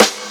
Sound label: Snares, Real